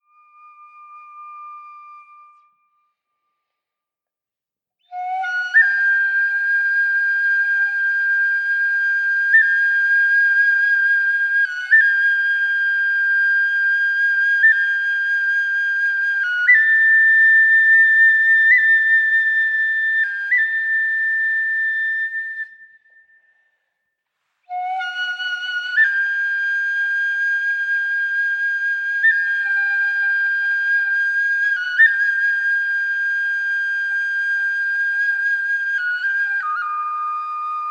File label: bansuri